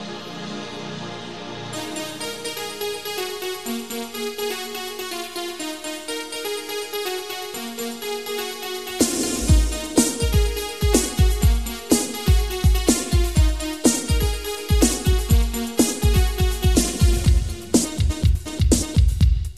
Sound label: latin freestyle ringtone